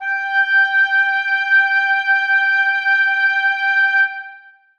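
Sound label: oboes sustain g5; Sonatina Symphonic Orchestra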